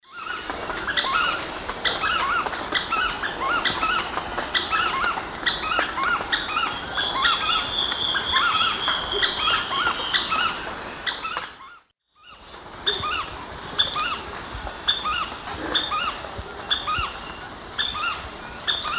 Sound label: Synallaxis rutilans, songs pair; S. America; Eastern Lowlands